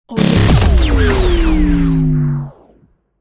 radio transition imaging fx; soundwood

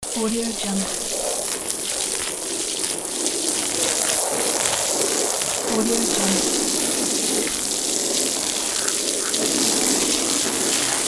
carpet rinse

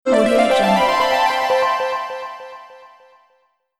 christmas holiday notification

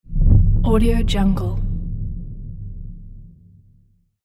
low rumble

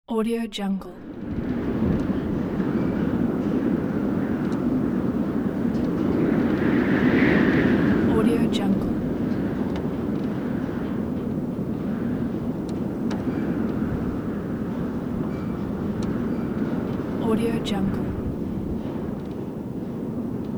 wind

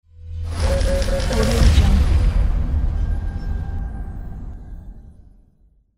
extraterrestrial weapon

